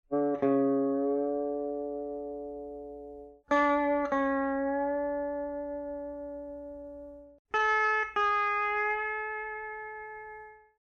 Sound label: slur guitar, chamberlin